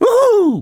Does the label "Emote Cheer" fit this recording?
Yes